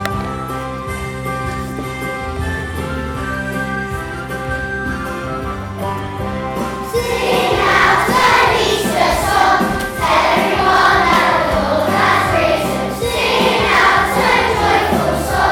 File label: sing out an easter song